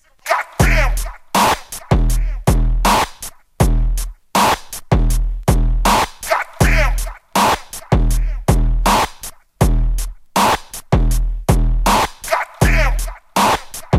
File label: scratch beat